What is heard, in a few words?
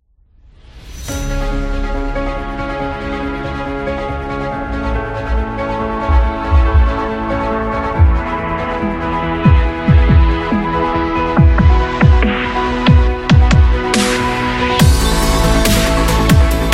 Cinematic Action